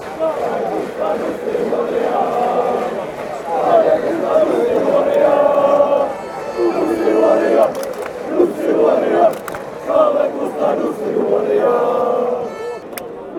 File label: finland chant